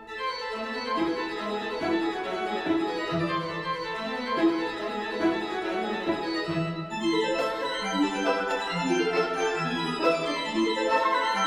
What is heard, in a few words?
Variation IV - Più vivo, Organ Concerto